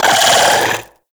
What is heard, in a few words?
Arachnoid Wraith Vocal Attack Short; Warframe; Corpus